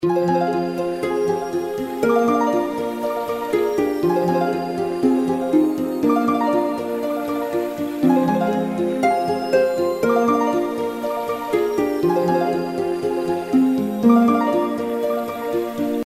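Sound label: fairy outro background music; Fairy Dust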